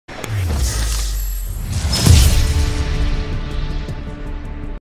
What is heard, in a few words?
victory royale sound effect; fortnite battle royale